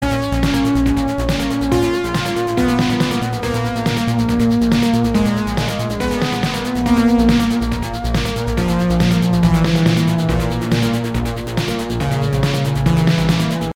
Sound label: Boss Music; Paradox Soul